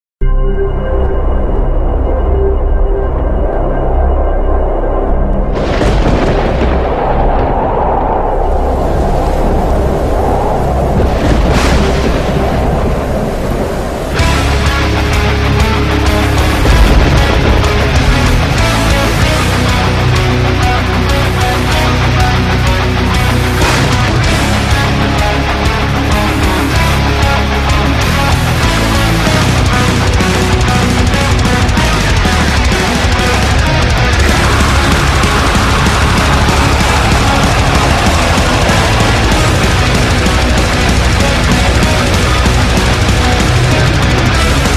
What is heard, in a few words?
rapid double bass